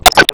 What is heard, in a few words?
Short Sword Impact Flesh; Weapon Impacts; Dendrit Short Sword; Combat